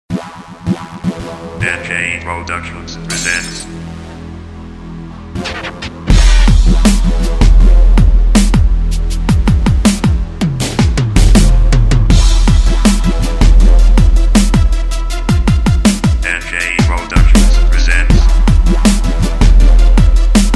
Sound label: Beat Crunk